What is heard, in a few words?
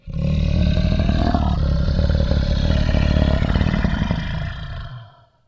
slow animal whirr; Voices